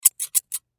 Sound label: Scissors Open; Scissors Open Close Multiple; Foley; Offices; Blastwave